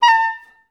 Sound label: OBS A4, Advanced Orchestra; Best Service